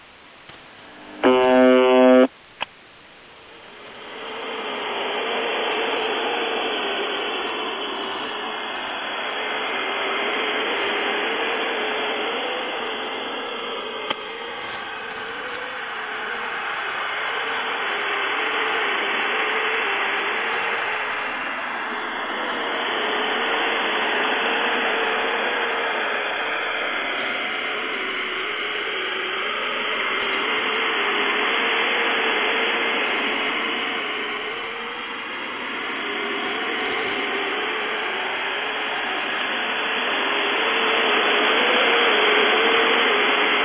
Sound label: buzzer click noise